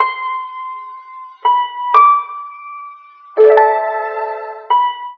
piano strings, Sad; loops

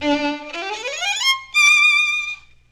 strings, Loops; Phonky

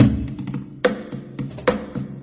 tom tom tom, drum loops

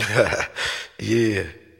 ha ha yeah; ooh ahh

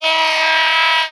shrill, Horns; Notes

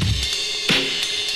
fris jazz, Drum loops Boombap

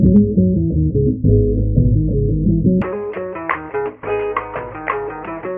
guitar, Phonky; loops

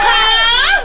female orgasm

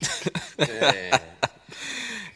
laugh

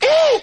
"ooh" scratch; Voice

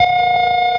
dial tone; Notes

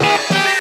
dos squeakas

